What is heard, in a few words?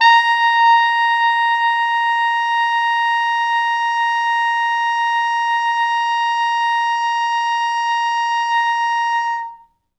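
tenor sax susvf ext. range, A#4; East West; Quantum Leap Horns Sax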